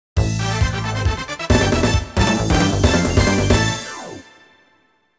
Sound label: Game Clear; psychic force puzzle taisen